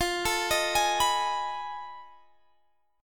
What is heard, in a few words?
F 7th Sharp 9th Flat 5th Guitar Half Step Down, chord